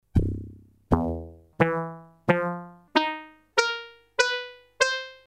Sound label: slap synth; yamaha vss 100